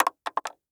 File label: metal wood drop; nut